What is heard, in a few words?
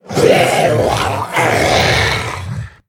Zombie Monster Die; Pain Train